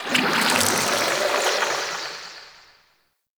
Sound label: Squelch Goop One Off; Props; Warframe; Tau; Ambience